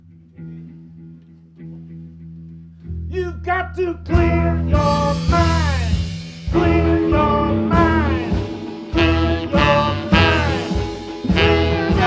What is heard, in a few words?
cym